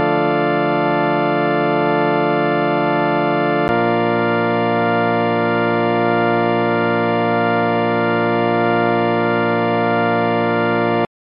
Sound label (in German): d moll b dur